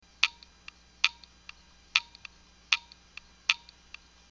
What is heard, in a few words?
hard drive click